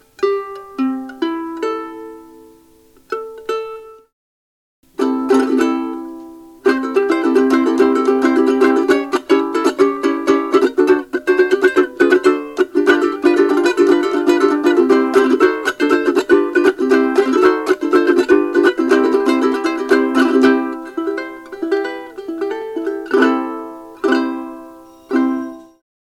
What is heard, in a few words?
beltona soprano, ukulele; hilo